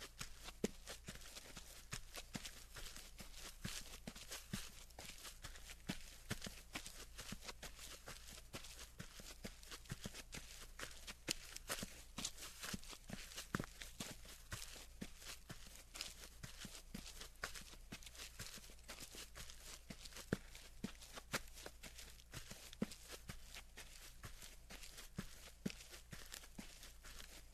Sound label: player footsteps; Slendytubbies V2 Beta